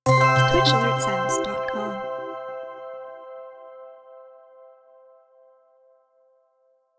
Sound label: Simple Subscriber